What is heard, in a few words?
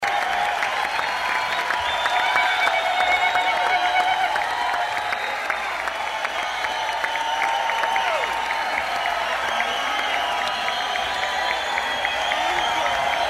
Crowd; St Louis, MO; American Theater